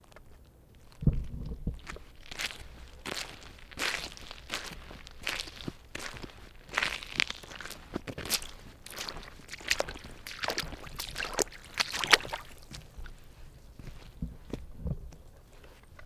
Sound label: Squishy Footsteps To Walking In A Puddle